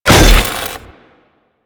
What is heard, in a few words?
Staff Impact D, weapons